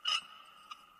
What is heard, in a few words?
ambient lamp squeaks; STALKER